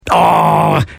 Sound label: Awwww